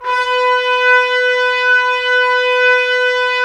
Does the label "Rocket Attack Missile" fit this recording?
No